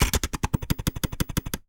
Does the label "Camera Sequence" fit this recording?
Yes